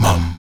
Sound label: VOX MUM E 2D, Afro Chants; Roland LCDP11 Africa VOL-1; Stacc Mums